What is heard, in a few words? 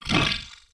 ghost armor attack; propack; magic